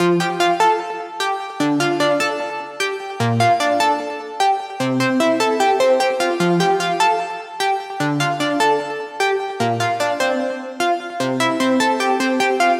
Melody F# 150 Goodbye, Color Bass Essentials, Melodic Loops; Ghosthack - Color Bass Essentials